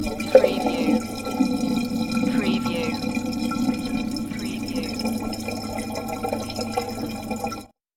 Urinating

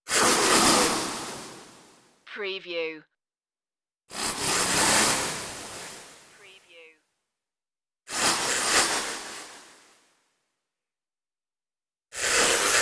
electric transformation shaking

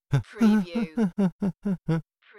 Male humming; beanstalk audio

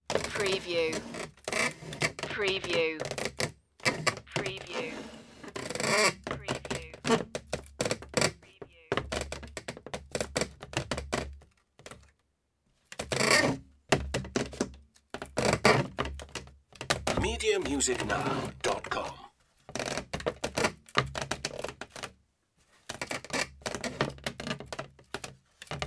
wood creaking

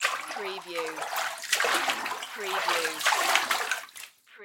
Splash Bath Tub

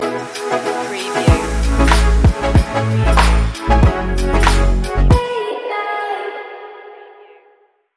pop stinger

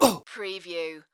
Pain Umph, Male Painful Grunt, Hurt

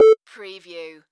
Beep Single; sound effects bible

